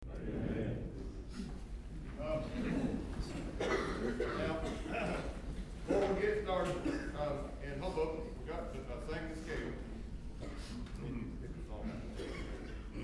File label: Scales